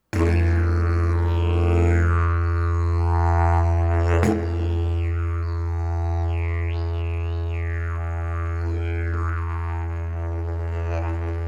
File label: didge